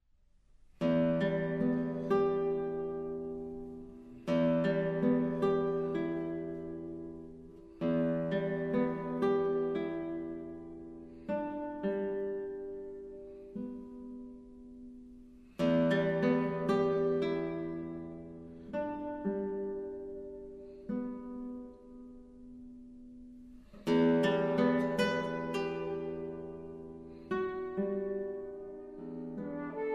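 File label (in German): Flöte Gitarre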